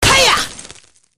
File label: hit; freak fortress 2; darklink